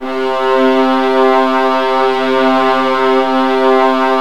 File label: Virtuoso Strings